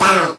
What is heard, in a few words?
Vocal Oneshots; Vengeance Samples; Vocals